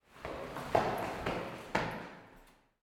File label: Footsteps In Stair